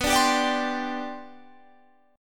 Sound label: C Suspended 2nd, B, guitar chord; strummed